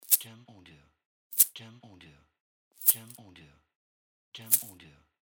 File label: Coins